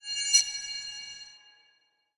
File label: light out